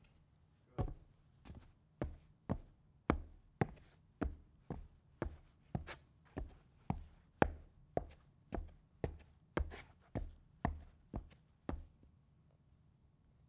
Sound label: wood